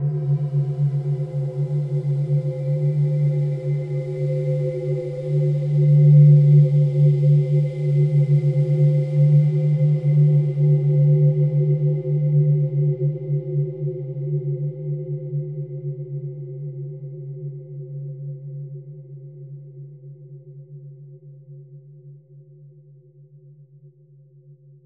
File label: Dronescape